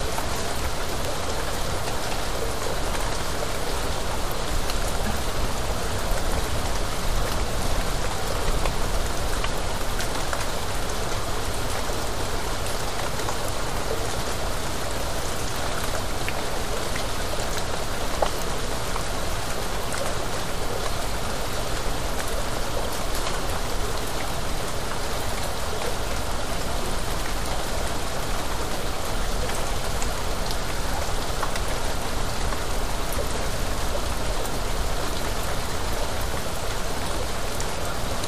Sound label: Rain Droplets, light